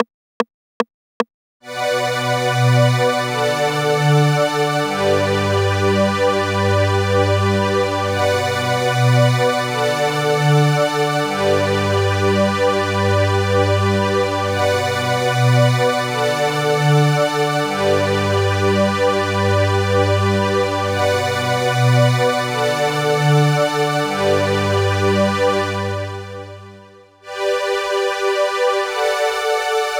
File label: Strings, 150BPM; open; Vengeance Samples